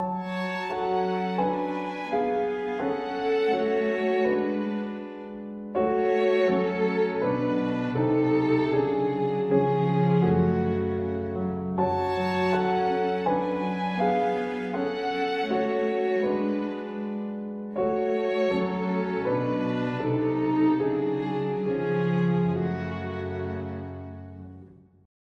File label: With Strings